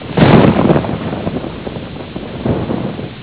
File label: rolling thunder